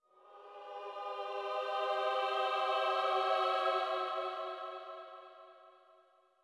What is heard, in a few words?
Choir